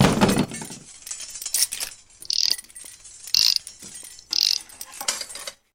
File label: Armor Crafting; Zomboid; The Workshop